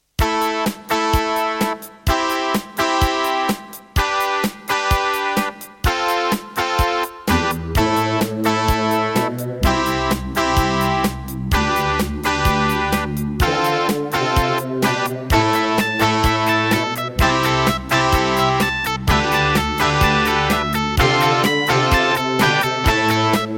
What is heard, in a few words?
Roland SCB-7; raptor call of the shadows